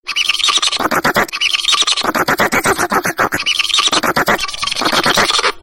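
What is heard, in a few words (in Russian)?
человек изображает звуки бобра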